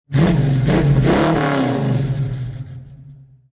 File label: Mustang 68 Fastback Rev Multiple Exhaust Perspective; Car Sound Effects